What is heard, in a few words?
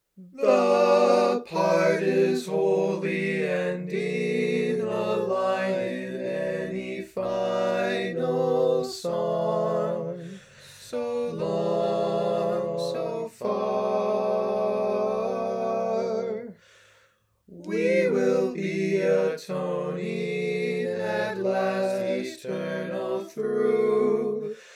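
Baritone, barbershop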